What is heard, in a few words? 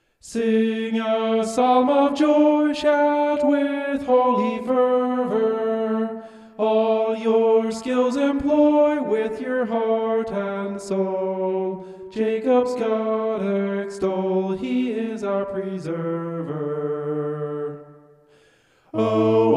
vocal homoph; psalm 81